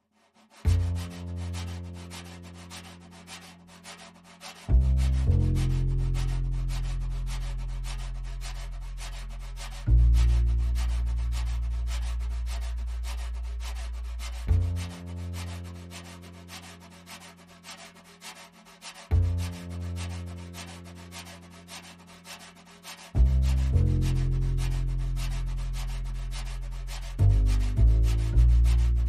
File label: Drums and Bass Mix